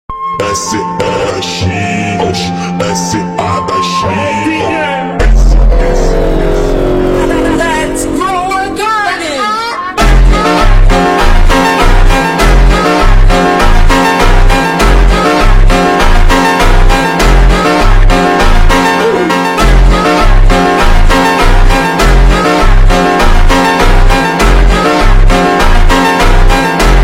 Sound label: Funk Super Slowed